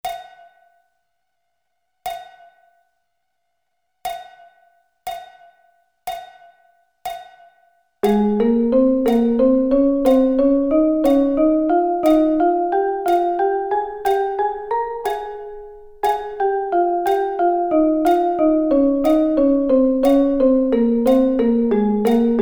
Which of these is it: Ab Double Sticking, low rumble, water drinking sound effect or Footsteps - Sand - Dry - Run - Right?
Ab Double Sticking